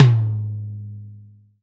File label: TOM TOM, Real